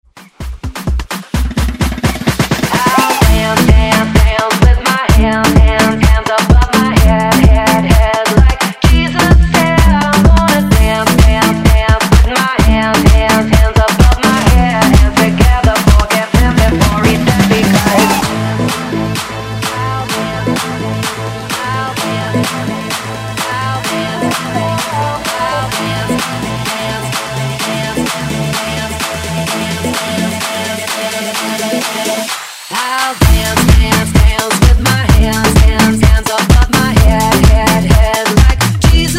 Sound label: Afrobeat Redrum